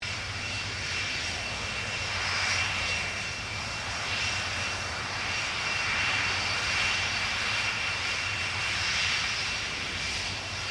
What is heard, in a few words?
1989 BOEING 747-400 JUMBO IDLE; LOOP; Airplanes; Vehicles